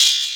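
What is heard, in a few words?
808 open hi-hat; Perc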